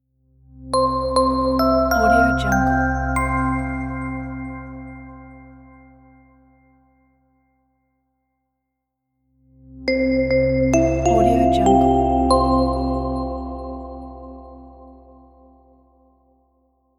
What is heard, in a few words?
classic logo